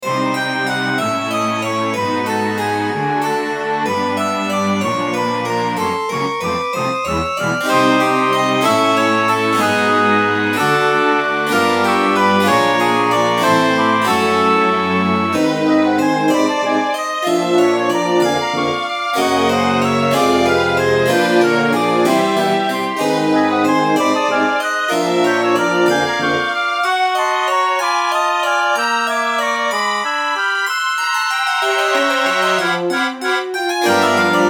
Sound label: cave